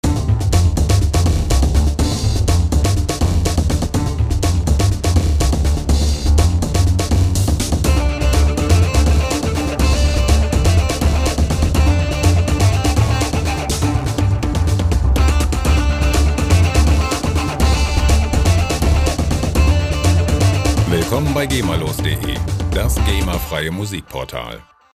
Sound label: dnb